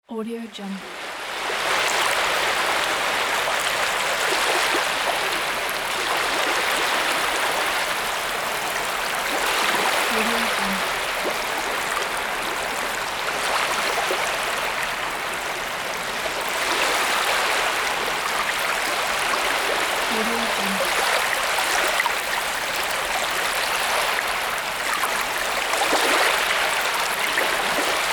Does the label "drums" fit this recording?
No